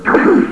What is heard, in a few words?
Smacked